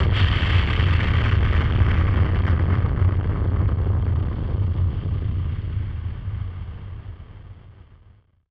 Drum Bomb; musicradar; bomb factory; Drum Bombs

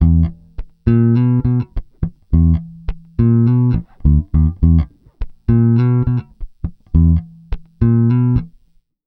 Pop, Bass

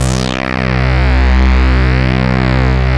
GRUNGE C2, SYNTHESIZER; GLUNGE

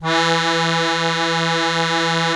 ZUPAN CHROMA, AKKORDEON; Best Service; Alpine Volksmusik

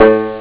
banjo; ST-XX Amiga Soundtracker Sample Packs

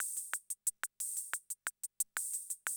CR78, DrumLoops

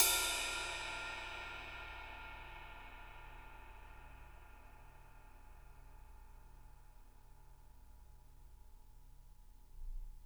RIDE M L; Roland MV8800; RIDE CRASH

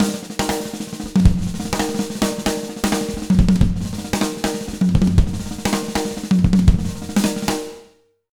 BEAT, Jazz Latin Drumloops; 120 BBEAT; USB Soundscan vol.08; AKAI

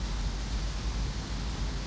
Ambience Loop, outdoor

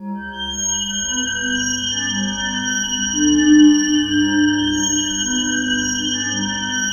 COLUMNS, SCI-FI CHIME; Spectrasonic Distorted Reality 1

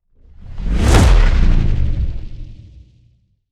Impact

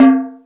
Percussion, Drum Machines E-MU Pro-Cussion

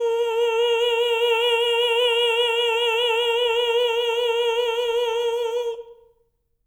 TENOR A#4; Spectrasonics; Symphony of Voices

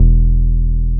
303 SUB E2P, SUB BASS

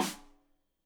Rim Shot Stereo; Deeply Sampled Drums; SMDrum

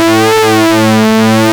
SYNCSWEEP A, ARP Synths; E-MU Formula 4000 Series Vol. 3; Analog Odyssey; ARP Synths II

